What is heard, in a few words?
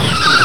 skid; on the green